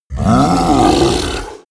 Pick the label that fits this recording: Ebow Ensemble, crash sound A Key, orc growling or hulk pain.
orc growling